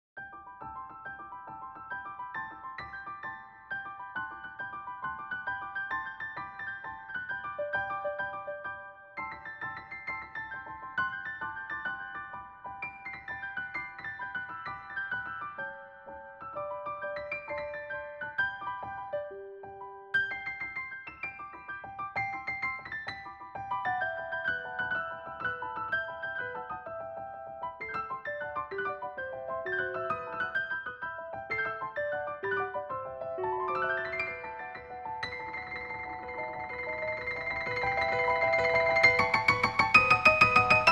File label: Music Box